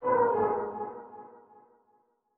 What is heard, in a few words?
ethno, 125, A